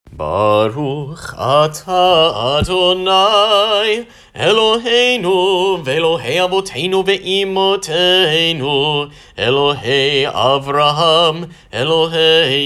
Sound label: Avot Chanted